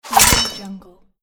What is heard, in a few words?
sword slash